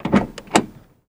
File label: BMW Car Door Open